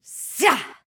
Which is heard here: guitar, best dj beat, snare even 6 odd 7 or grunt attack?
grunt attack